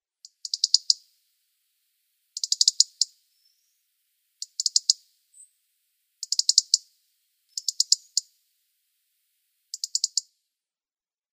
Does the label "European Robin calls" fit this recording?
Yes